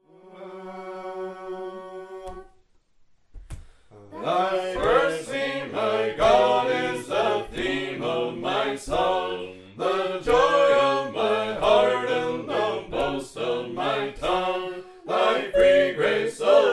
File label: words only fast, sacred harp; Versailles